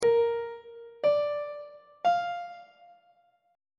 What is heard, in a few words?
g phrygian chord 3 is b flat major; on treble clef